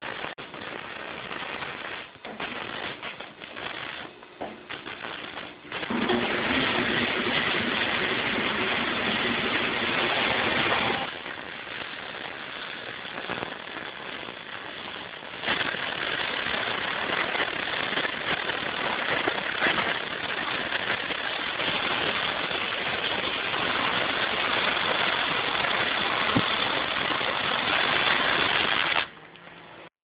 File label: welding